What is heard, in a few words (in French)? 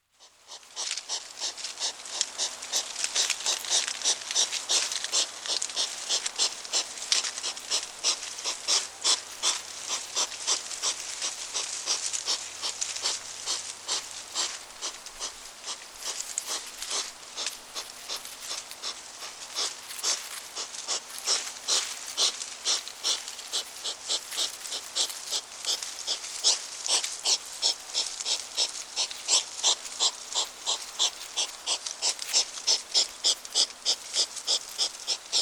le souffle du herisson